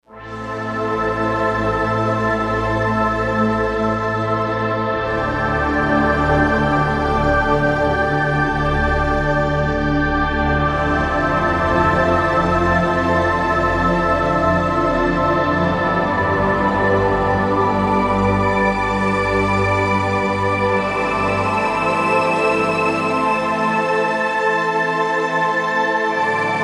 Slow Sky Choir; Digital Synthesizer

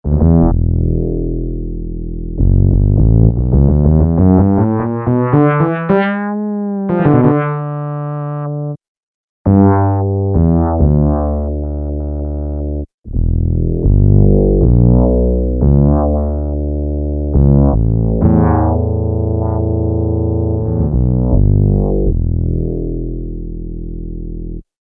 Slow Fretless, Peavey DPM Spectrum Bass

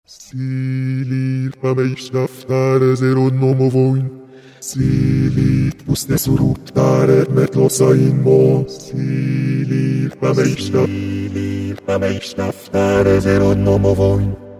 Ezeronomovoy, Roland V-Synth

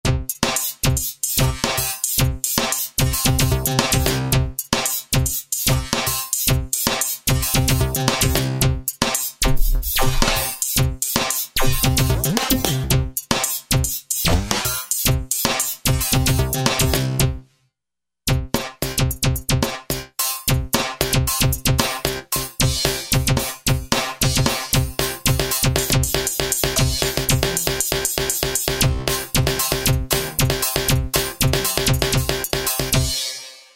Lectro KIT, Roland V-Synth; Factory Patches

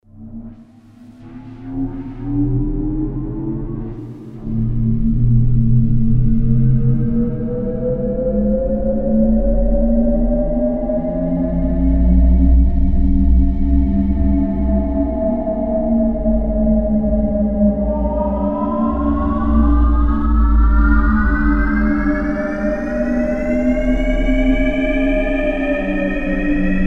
Siren, Roland JD-990; Future Siren; Vintage Board

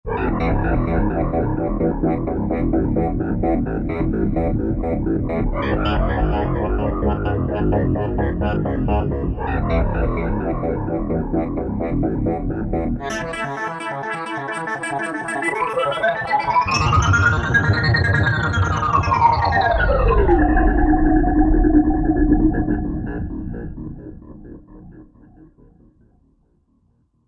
Vectoring, Roland JD-990; Factory Patches

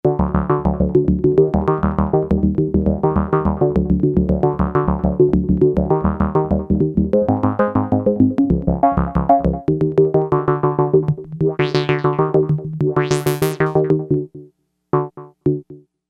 Pluck

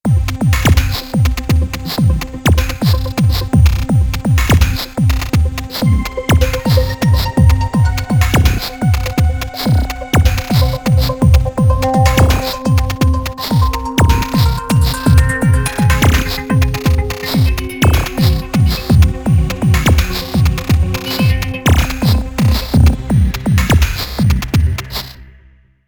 Warping Beat, Korg Radias-R

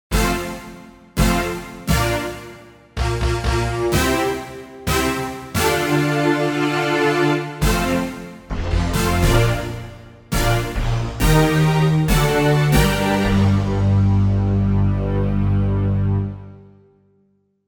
Orch Stab, Roland JV-880; Factory Patches

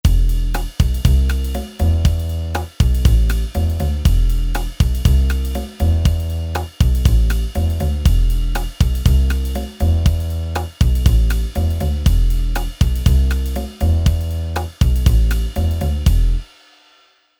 Jazz

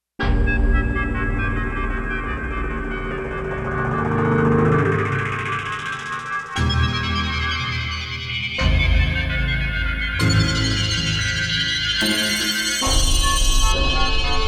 FX Menu

